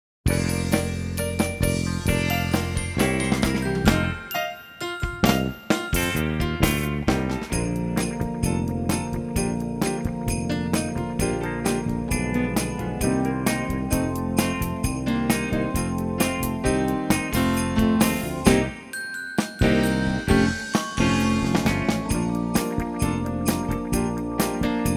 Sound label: Accompaniment